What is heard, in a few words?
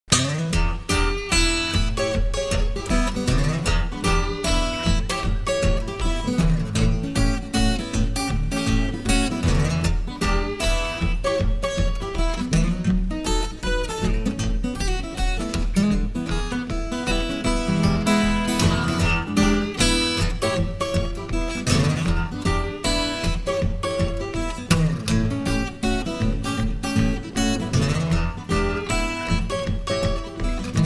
Acoustic, Live